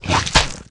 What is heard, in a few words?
spit, aliens mutant